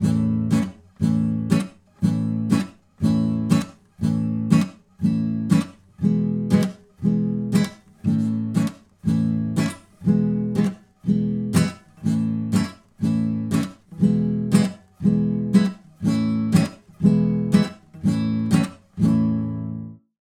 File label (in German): Vogelhochzeit mit Wrumm-Tschak-Anschlag Gitarrenbegleitung